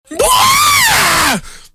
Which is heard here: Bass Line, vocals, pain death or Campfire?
pain death